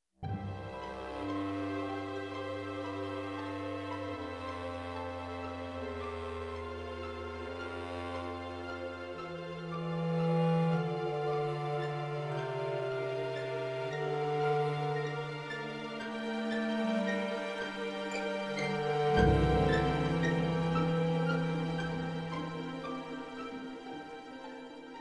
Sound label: melody ww summary bass clarinet